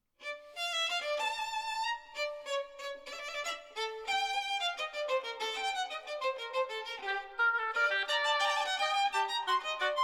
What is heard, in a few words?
fugue for violin and oboe